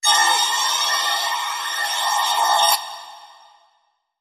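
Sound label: Alarm Airy Tone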